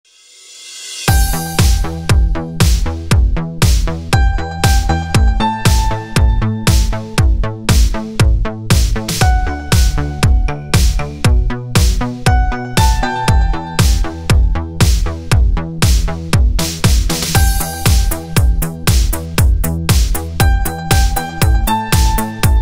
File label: italo disco remix, 80s music